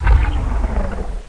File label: zoom out; duke nukem total meltdown